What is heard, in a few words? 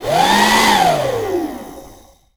Vacuum Cleaner Short Burst